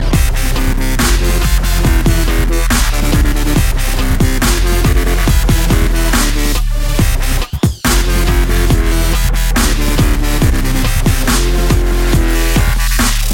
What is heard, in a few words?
dubstep; electronic